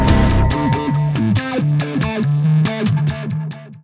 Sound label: victory sting; AirMech Command VR